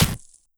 ric grass, weapons; svencoop

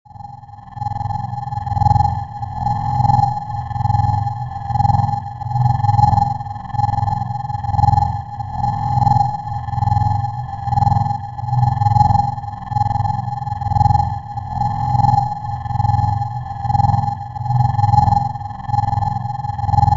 forcefield; ambience

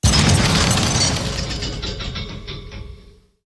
large door open; svencoop

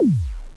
menu; gearbox

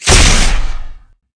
law fire, weapons; cstrike

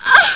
ahh

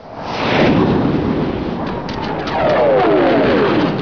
jet drop; svencoop; jungle

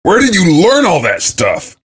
cheer; svencoop; voice commands; SeriousSam

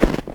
player snow; svencoop

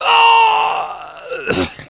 death; fgrunt

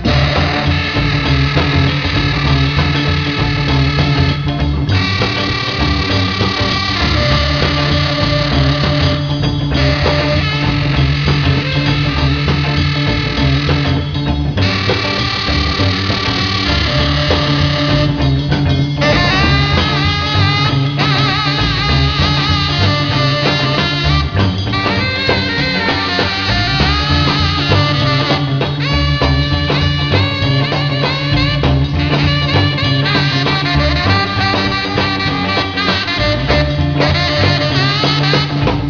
comanche loop; tfc

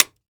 357 chamber out, weapons; svencoop

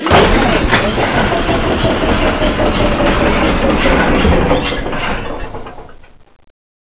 out of gas, tank

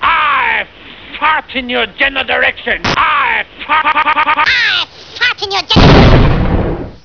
fart; svencoop

